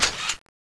motor, weapons; cso; turbulent11

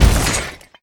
run

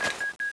reload in, weapons; tfa cso

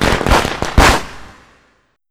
firecrackaz

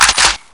mini bolt, minigun; weapons

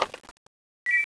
draw, weapons; tfa cso

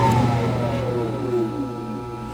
smelter engine off

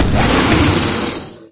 ceiling attack, wraith; doom3

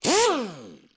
hydraulic

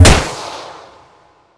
electro laser fire

